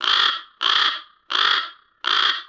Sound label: bird caw long